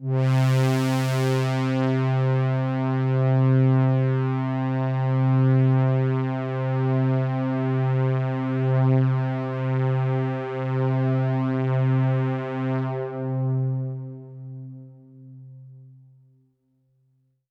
Pad C, Synth Prophet One Shots; Electronisounds

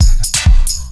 4 floor beat, trance

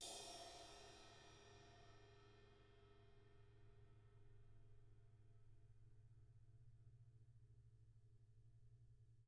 BRUSH CYM

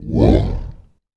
VOICE FX, Dance Vocals

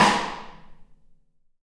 RIM, ST AMB SNARE

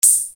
Hat, EuroDance

